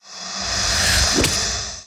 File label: Wraith Attack; Puzzle Chronicles; PS3; PSP; X360